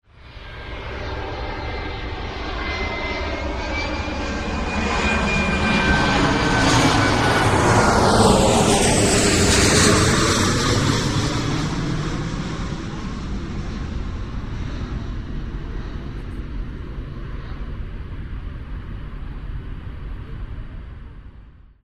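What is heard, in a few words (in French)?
a319 approche final; Aircraft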